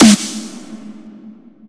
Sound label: perfect snare A key